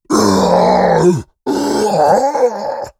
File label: pain scream, Orc Creature; Sounds Great; Orc Creature Library